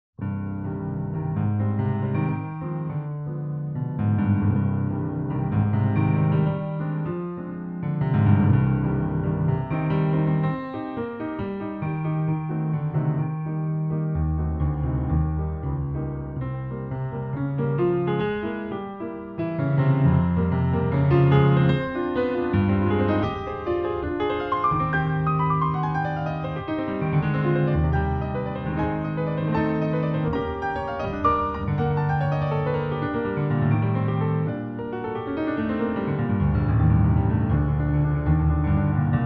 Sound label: Piano Sonata op 21 1st mvt; Chaminade